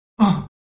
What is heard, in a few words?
Grunt Male Soft Interior Perspective; Human Sounds